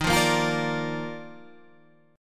D sharp Major 7th Suspended 2nd; strummed; 11,8,8,10,11,10; JGuitar

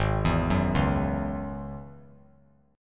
Ab 7th Suspended 4th Sharp 5th Ab down; Ab7sus4#5 arpeggiated

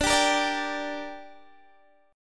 G Minor D, chord

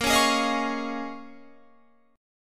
A sharp Minor 11th, Guitar, Open F; 22,20,20,22,20,20; strummed

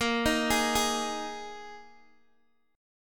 A sharp 7th Suspended 4th A sharp, Guitar, Open D

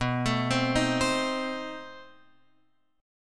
B 7th Flat 9th B Guitar Open F; B7b9; arpeggiated

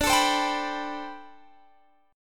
D 13th Suspended 4th D; D13sus4 strummed

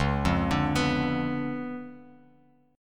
C sharp 7th Flat 9th Bass Standard, chord; C#7b9; arpeggiated; JGuitar

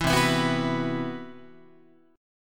C Major Sharp 11th, Guitar; CM#11 strummed; JGuitar

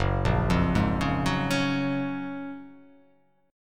F sharp Major 9th

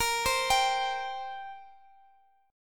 G Minor Double Flat 5th A sharp

